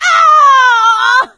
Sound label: hurt critical; Left 4 Dead 2; producer